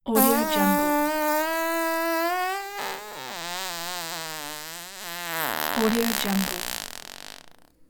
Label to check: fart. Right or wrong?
Right